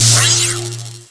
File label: displacer teleport, weapons